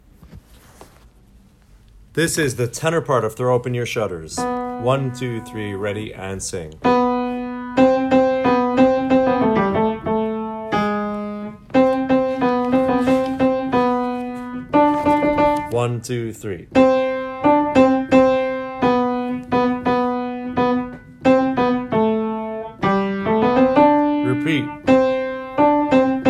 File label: tenor, choir